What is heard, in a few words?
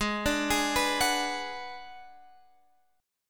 G sharp Minor 7th Flat 5th, Guitar Drop D; x,11,12,13,12,14